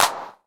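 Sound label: 909 clap, NOISY; Wizoo - Platinum 24 Electronic; 909 NOISY P2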